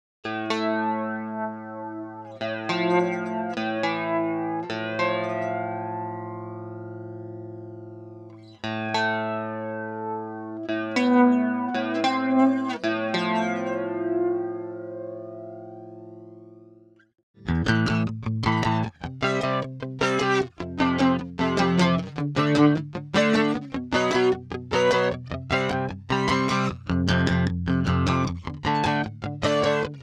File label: FLANGED DRY FB